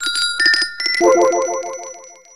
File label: notifier Wind bell; ZTE Open-C